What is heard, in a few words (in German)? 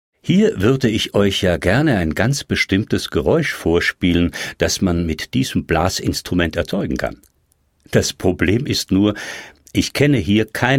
Indisches Blasinstrument